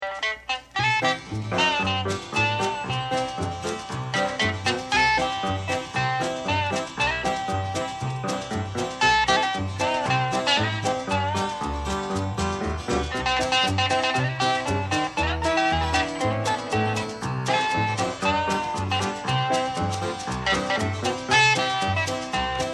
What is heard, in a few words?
Happy Strings